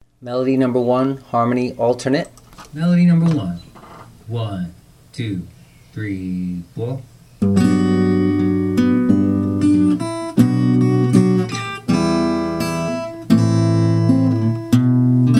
melody alternate

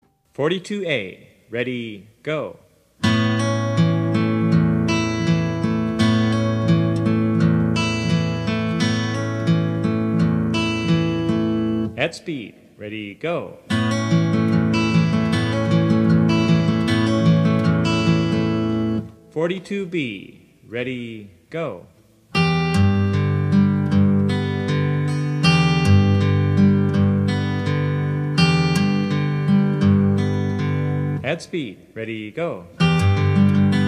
travis picking

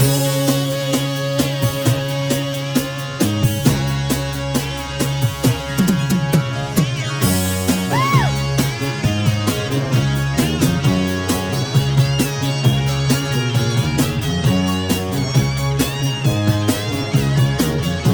loop key C

